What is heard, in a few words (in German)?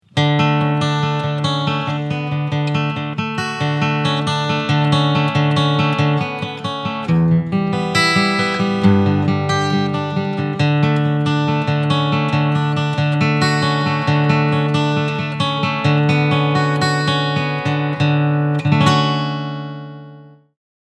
PRS SE A20E Picking mit Mikrofon